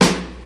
Steel Snare Drum F# Key, snare sample tuned to the F# note